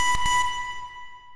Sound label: fami b3